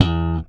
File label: Slap One Shot E, Bass; Bass X